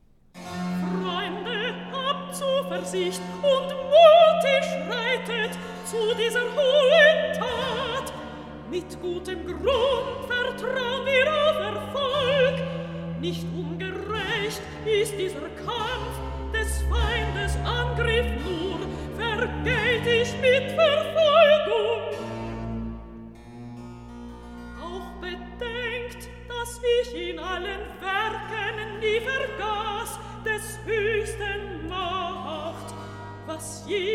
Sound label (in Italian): Recitativo; Cyrus; Freunde, habt Zuverischt; Handel Masterworks; Klassika